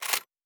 Weapon Foley

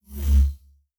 Light Saber; Sci-Fi Sounds

